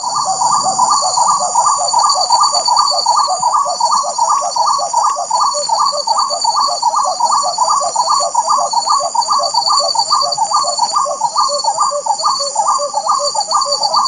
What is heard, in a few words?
Odontophorus capoeira; Jd Bopiranga; Itanhaem; SP; Brazil